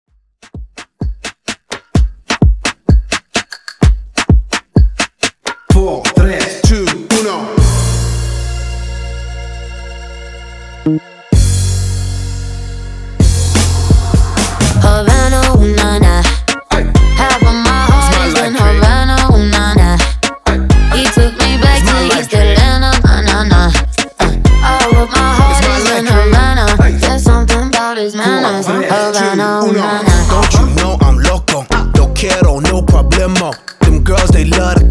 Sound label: 128 Bpm